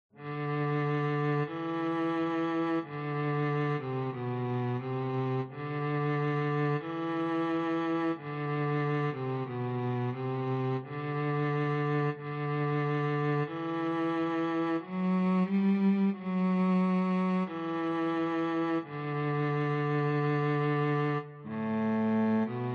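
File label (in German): O du froehliche, Violoncello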